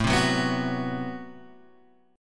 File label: A Minor 6th Add 9th A Guitar Open G